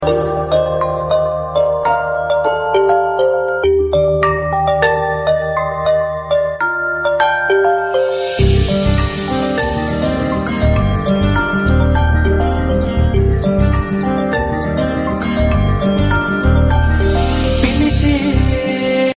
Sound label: Melody smooth